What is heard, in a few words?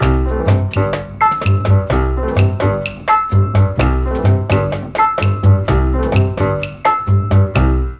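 C3 bass strings, Mellotron; BASSA+STRNGS; Mike Pinder - Mellotron Archive CD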